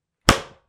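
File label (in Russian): удар ремнем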